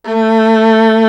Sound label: SOLO VIOLA; East West; Global Sounds